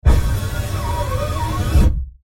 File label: Sci Fi Glass Shield Close Door; Blastwave